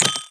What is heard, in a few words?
ui correct